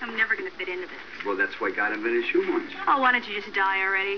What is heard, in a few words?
shoehorn